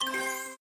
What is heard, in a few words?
star shine